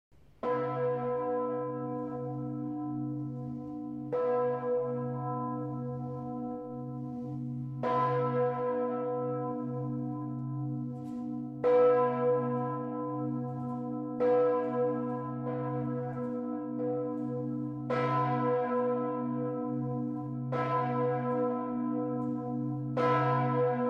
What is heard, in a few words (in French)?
cloche couvre feu; dob3; 1786; cathédrale de Strasbourg